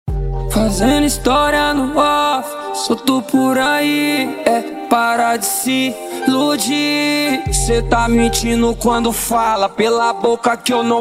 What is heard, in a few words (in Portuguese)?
eletrofunk; caixa bobi do joaozinho